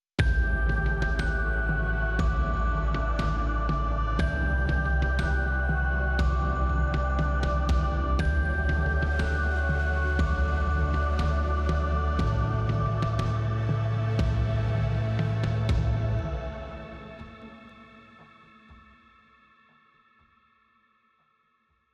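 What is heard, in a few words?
Desert Music, Final Call And Response 2nd Loop 2 Beat Pickup Transposed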